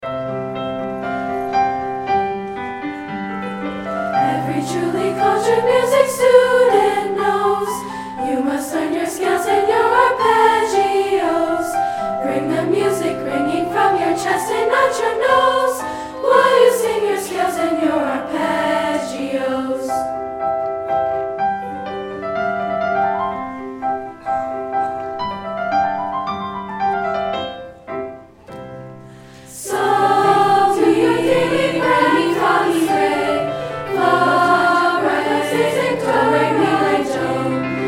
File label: scales and arpeggios